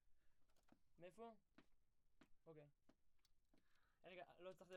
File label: Sax; Gefell